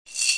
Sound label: slide